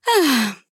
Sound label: laugh; devour 2021 gamerip pc macos